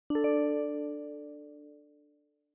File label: OS Sound Notification